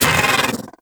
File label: Bug Vocal Pain; Characters; Warframe; Hunting Critters; InfKDriveBug